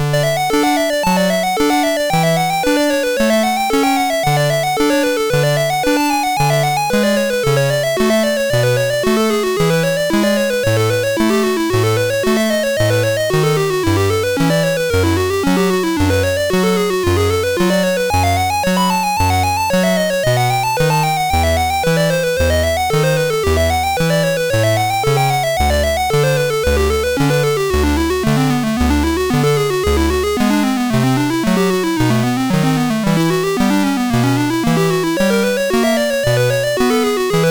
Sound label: MOS6581; MOS6581R2; Prelude No5